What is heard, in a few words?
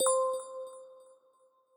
correct